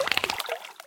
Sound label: squak; World Of Goo; Fish